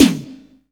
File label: FX MIX TOM, DRUM; AKAI S6000 CD-ROM - Volume 1; DRUM RYTHM